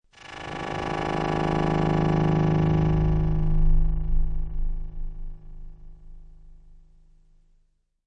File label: Strange Emissions, Force field, magic field or magnetic field; Science Fiction Sound Effects